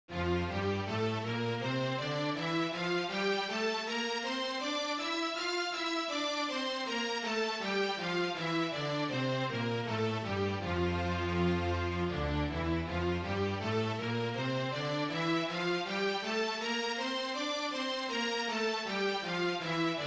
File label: minor scales; MPYO